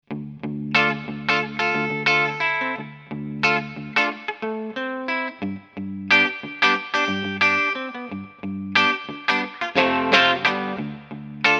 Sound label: HK TM36 clean